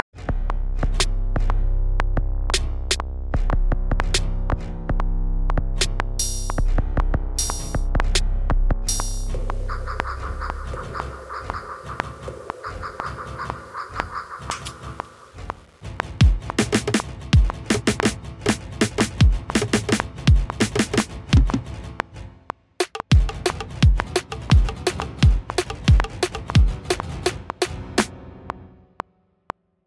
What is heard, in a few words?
Game Music; Cheyenne